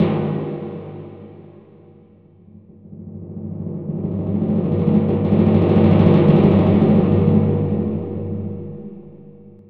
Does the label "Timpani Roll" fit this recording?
Yes